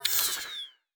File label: Device Stop, Electric; Sci-Fi Sounds